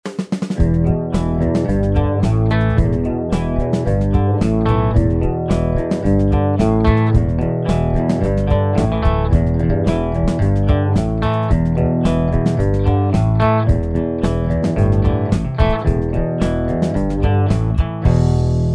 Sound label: Clean